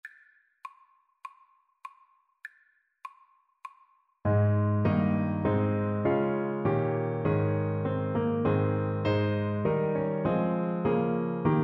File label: ASAX, saxophone